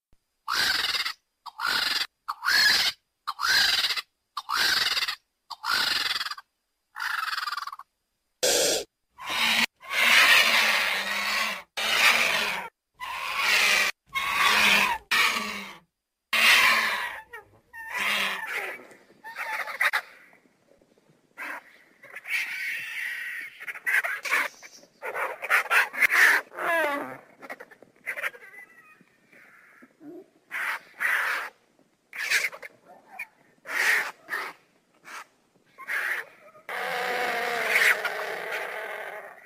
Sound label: Vulture Sound Effect